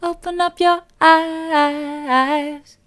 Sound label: open up your eyes soft, vocal